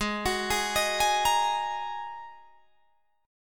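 A flat 7th Suspended 2nd A flat Guitar Drop D, chord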